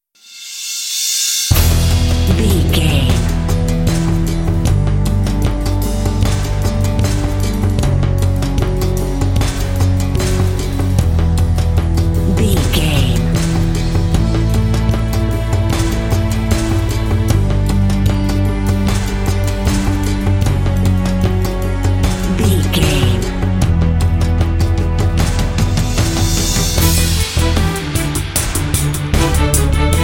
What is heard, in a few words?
tense cinematic score